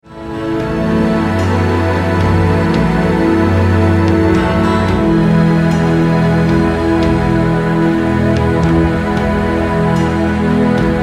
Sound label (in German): Entspannungsmusik